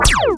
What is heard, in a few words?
shoot; SpaceInvaders